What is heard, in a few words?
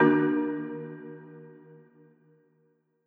Marimba Hit